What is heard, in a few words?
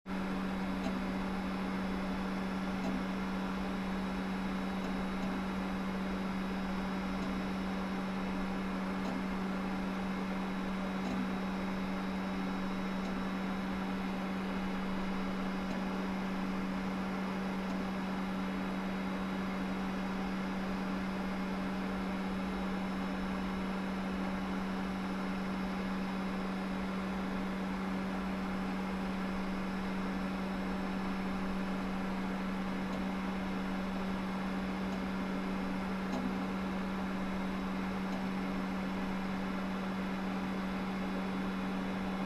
Computer Download